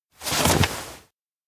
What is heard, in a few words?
dismount; anemoi